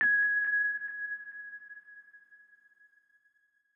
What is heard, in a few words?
Majestic Key One Shot; Vignette Kit